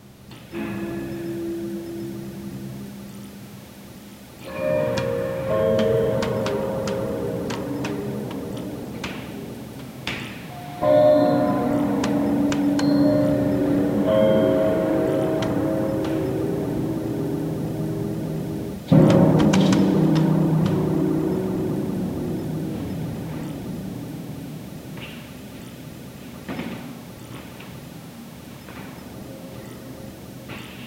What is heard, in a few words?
eerie ambient sounds of a